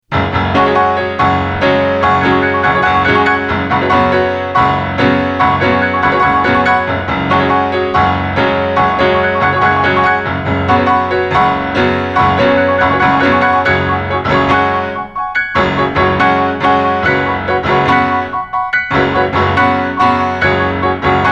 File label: Piano Drum